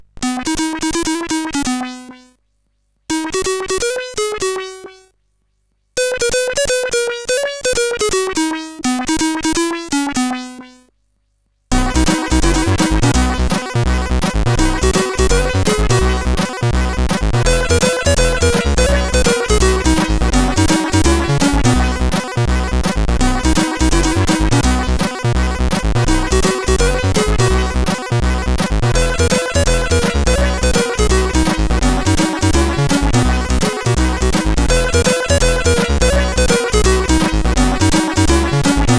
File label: Old Bear, sid CSG8580R5